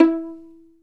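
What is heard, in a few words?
PIZZ VIOL right, Violin; E-MU Producer Series Vol. 2; More Studio Essentials; Composer; St. Arco Solo Violn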